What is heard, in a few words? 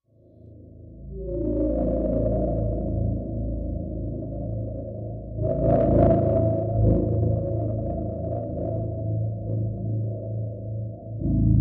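Distant Star Low Distortion; alien swamp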